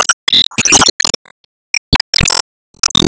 Se Enemy Appear; disney star smash

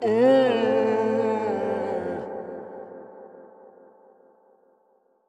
Toxic Mask Roar; Going Under

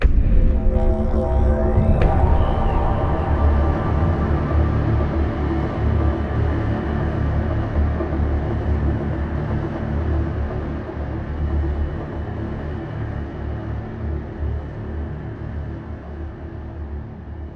Tomb Stinger; Peak (2025)

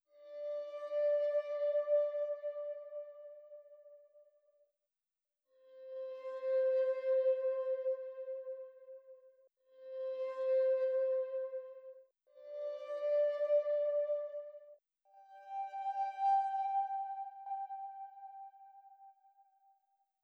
Pads 90

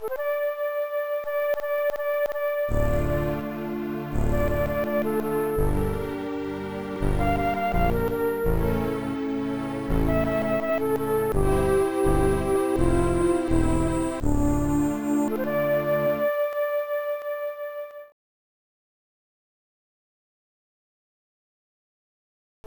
end lose; Castles II Siege Conquest; cd32 amiga gamerip 1993

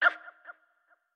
Skeleton Hurt; Going Under

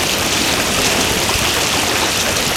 Tyres Waterlow Roll

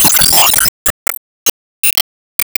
Enemy Rose Queen Active Attack Hit

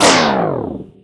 MagicItem TimeTwister In

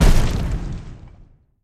Big Leap Impact; Caffeind Founder; Going Under

